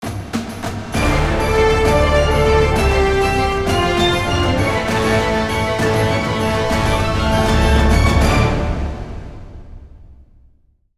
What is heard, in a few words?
Win Theme; Brawlhalla; St Patricks